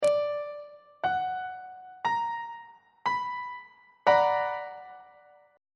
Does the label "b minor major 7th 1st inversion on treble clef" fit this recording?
Yes